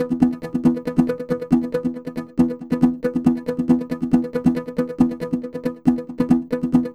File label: Electronic Percussion